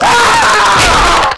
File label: Ahhhhh